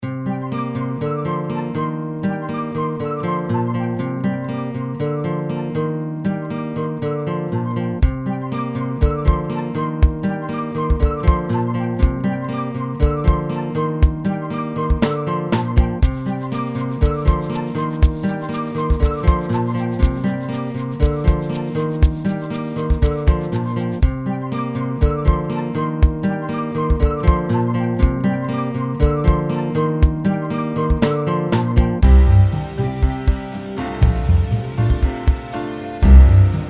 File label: bgm